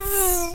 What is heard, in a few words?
bee; zoom